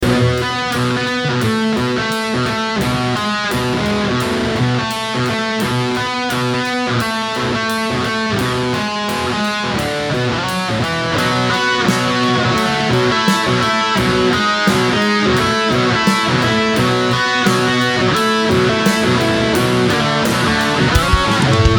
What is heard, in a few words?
Rock Song